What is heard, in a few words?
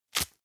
velcro open fast